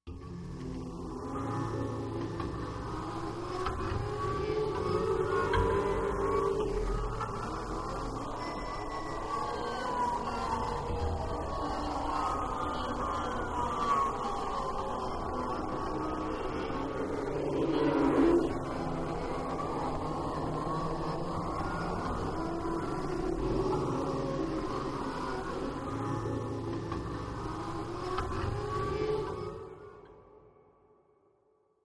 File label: Ghosts of Crowds Past Slow Motion Voices Swirl Motion